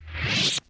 saber off fast; lightsaber

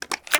rifle reload

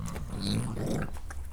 banshee idle; npc

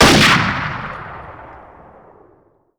rifle fire

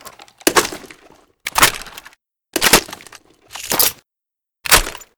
pkm reload

